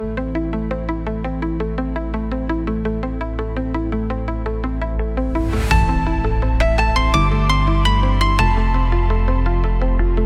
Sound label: instrumental chill out